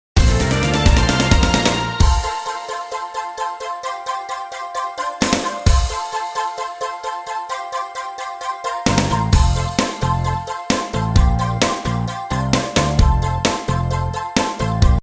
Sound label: Energetic, music